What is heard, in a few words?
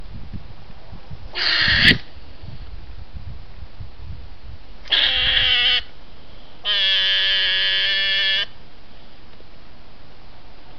hedgehog scream badger; European Hedgehog; Vocalisation